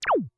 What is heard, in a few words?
Camera Free; Switch to free camera movement (Recorded)